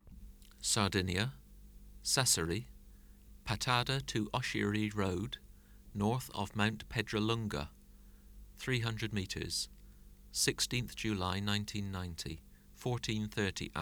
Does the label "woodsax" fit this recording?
No